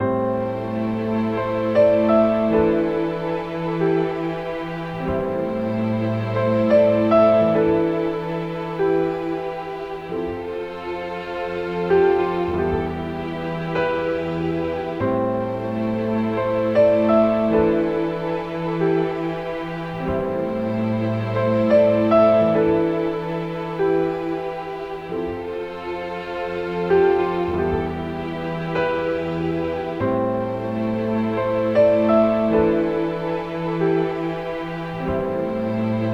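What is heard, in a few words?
BGM Cinema Suspense; tokimeki memorial girls side 4th heart; Scenario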